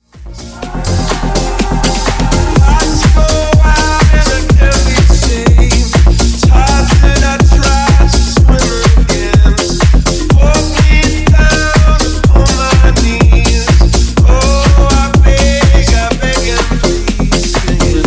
Remix Clean 124Bpm

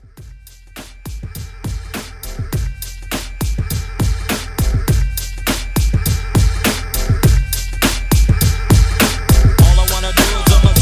90s Old School Re Drum

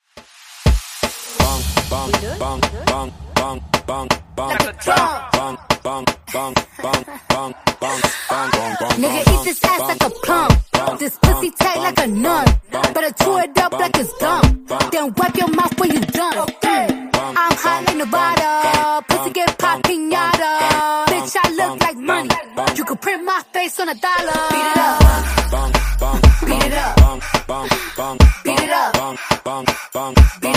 bongos

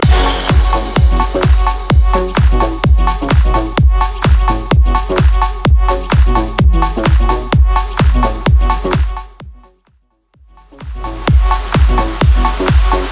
Bass House Edit

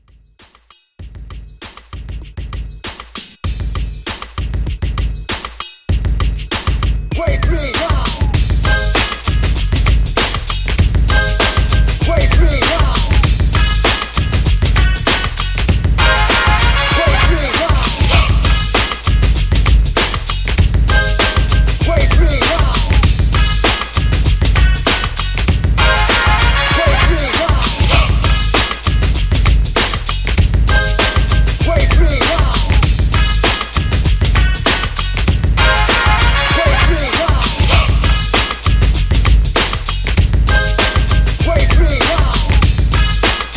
90s Extended Redrum 98 Bpm

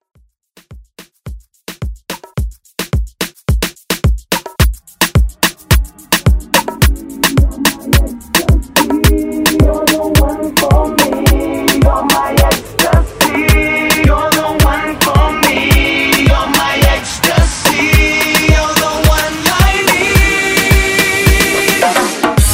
Moombah Edit

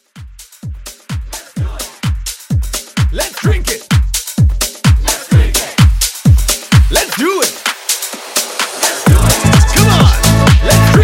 House Mixshow Edit 128

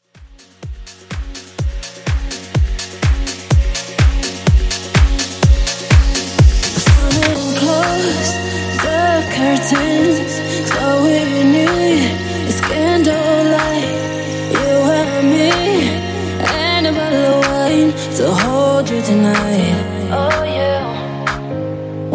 House Redrum 125Bpm

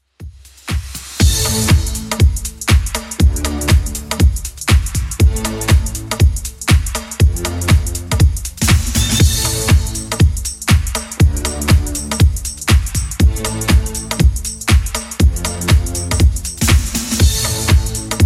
80s Clubmix 120 bpm

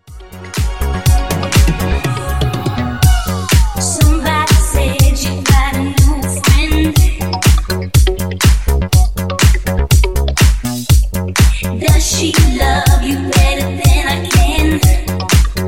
Funk 122Bpm

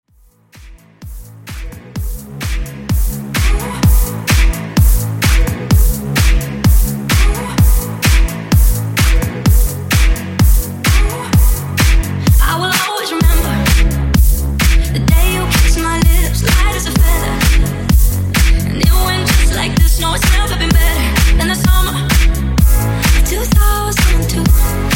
Top40 House 128 Bpm; Redrum

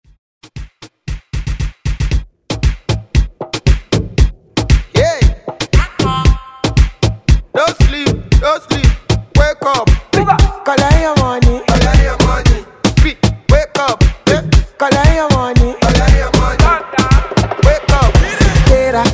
Afro Moombah Redrum

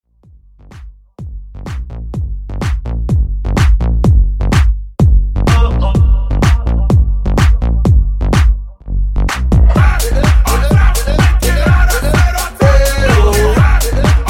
top40 bass house quick hype

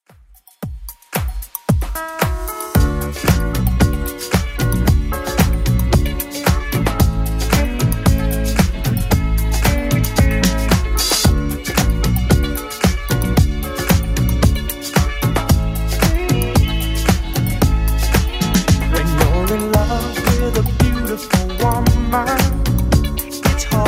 70s Club Edit 113bpm

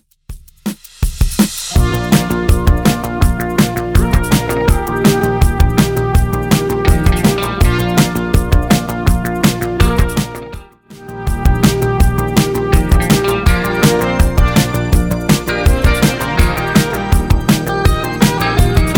70s Redrum 81bpm

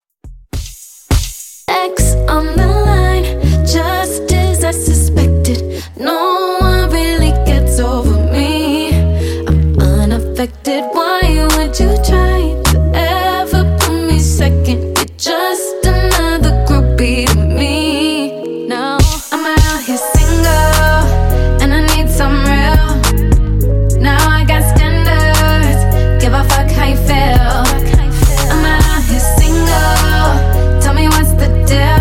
DIRTY 104Bpm

